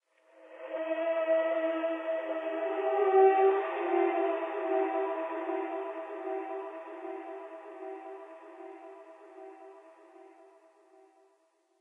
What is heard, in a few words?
strange sound; STALKER; minecraft